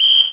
whistle

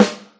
soft hit clap

